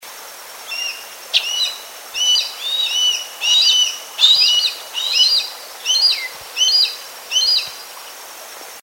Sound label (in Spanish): gavi mixto; cantos